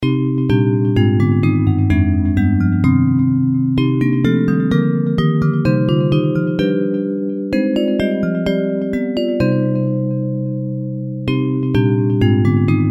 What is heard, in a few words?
Bells